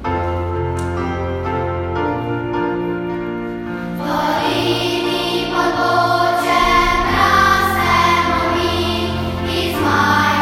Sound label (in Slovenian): SOLSKA HIMNA vokal; OPZ